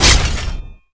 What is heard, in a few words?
metal heavy slice metal; skibidiclaw